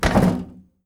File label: Metal Footstep